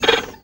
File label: VIO MISC, MISC VIOLINS; Best Service; World Colours